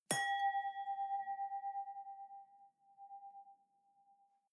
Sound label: wine glass hit ring fluctuate